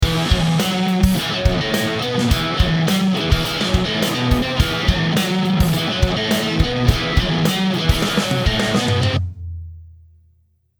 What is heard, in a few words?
riff